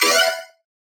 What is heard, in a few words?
Riddim Synth Hit E; One Shots; Pout; Ghosthack; Riddim Essentials 2020